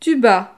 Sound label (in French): tuba